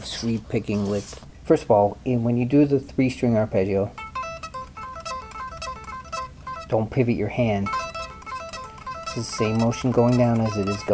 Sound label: sweep picking lick, guitar